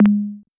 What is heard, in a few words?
Tom Hi